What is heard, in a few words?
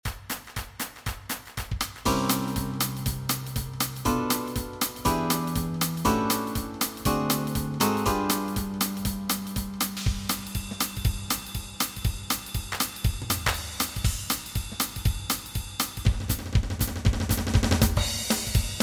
Backing Track, walking bass